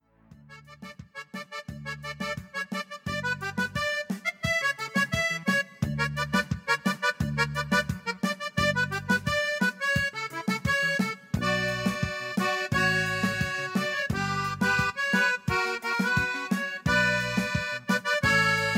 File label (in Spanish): Reggaeton, ritmos